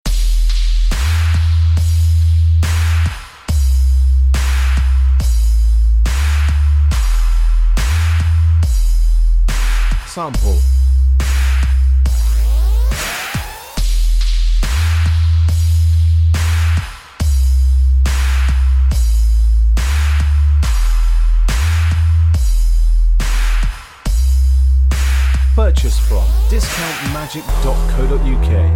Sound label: dubstep, backing